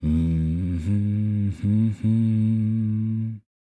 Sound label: voice humming